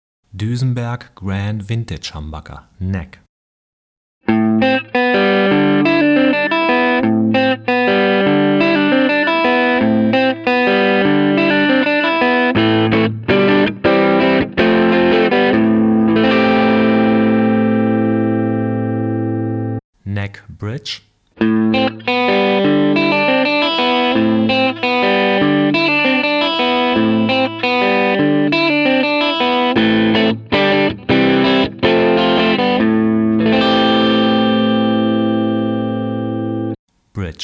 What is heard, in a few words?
Duesenberg Grand Vintage HB; Humbucker Sets (2 PUs)